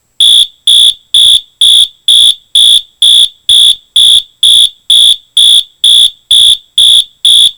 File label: MT March Time Horn